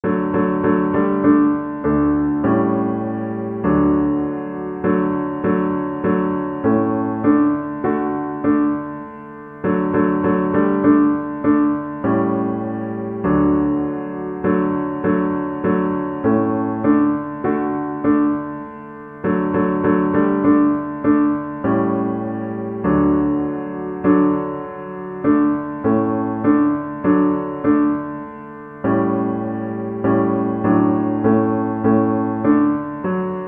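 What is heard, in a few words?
viola karaoke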